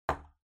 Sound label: Kitchen Timer Put